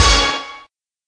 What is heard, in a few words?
News Channel Hit